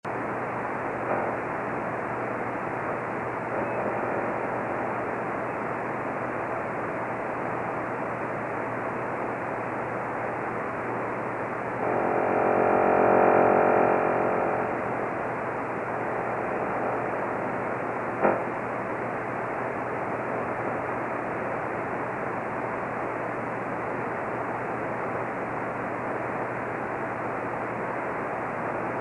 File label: SCAN 40.670MHz CW Meteors